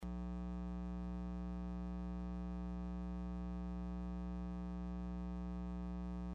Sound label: audio buzz